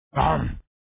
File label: zombie Die; TDGame